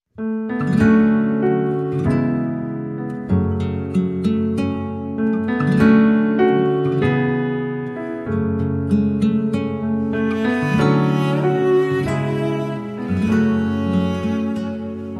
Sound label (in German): Ich steh an deiner Krippen hier Instrumental